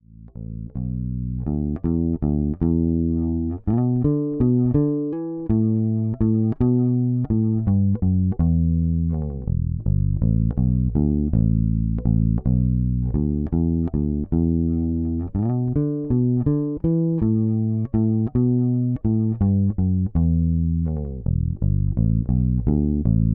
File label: Radial JDO Fender Precision Bass